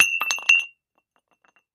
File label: Glass Shot Glass Drop, kitchenware; No Break